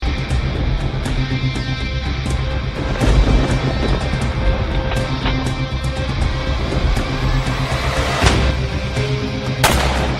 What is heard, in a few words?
pakistani movie ringtone; waar